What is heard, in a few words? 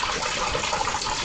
fill liquid